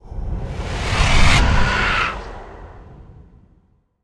growls; Dar K Magnus

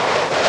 Sfx Winter games

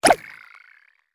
Grab Wall

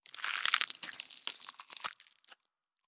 Spider Web Squish

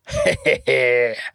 Big Short Laugh

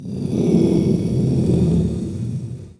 SFX GRANITE; Gothos

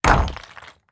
Impact Rock Debris

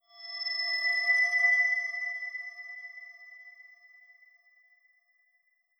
Tablet Start

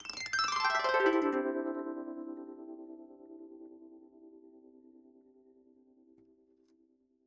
Kantele

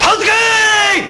Japanese Shout; Mars Sortie

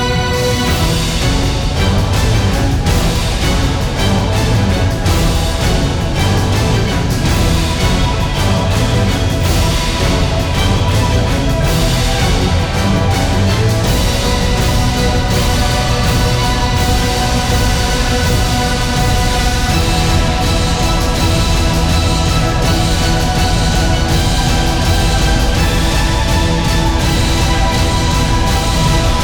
Theme Epic; Monster Jam Steel Titans

